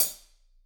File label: HH, Drums; Bob Clearmountain Drums I